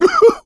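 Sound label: laughter, male; survivor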